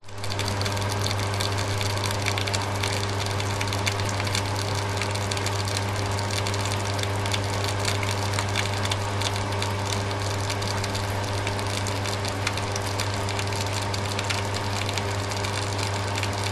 industrial pump swimming pool filter operate water flowing through clicks